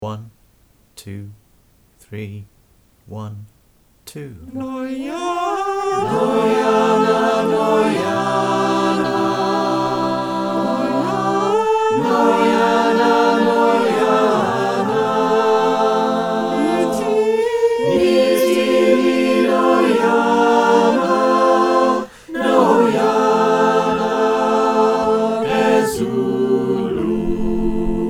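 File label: soprano alto tenor bass Mix, choir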